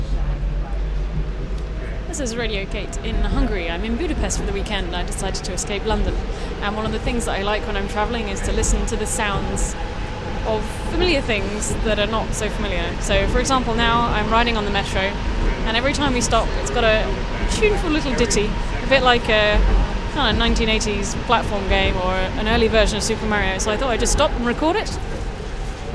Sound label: sounds of the hungarian underground